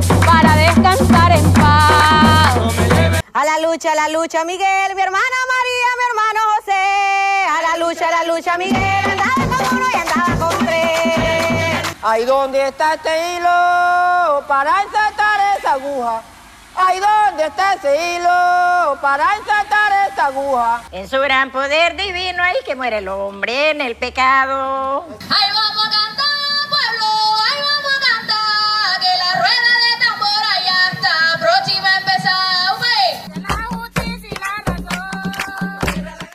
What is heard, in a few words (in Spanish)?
voz llana